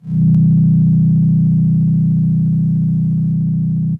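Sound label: DX100 F#4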